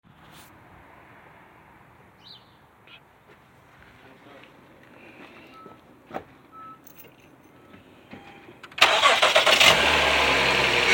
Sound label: cold start noise; 2g rdx